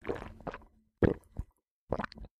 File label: drink